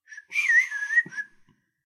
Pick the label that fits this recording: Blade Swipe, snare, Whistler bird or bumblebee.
Whistler bird